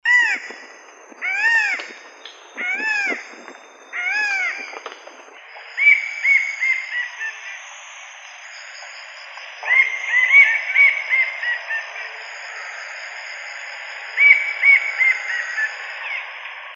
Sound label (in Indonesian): suara cekakak murung